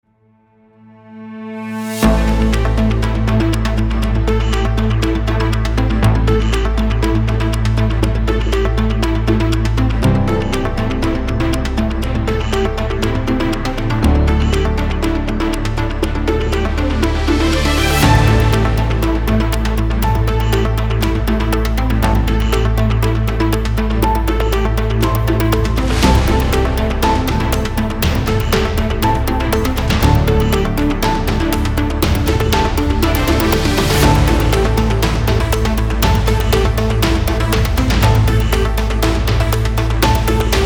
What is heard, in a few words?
breaking news intro music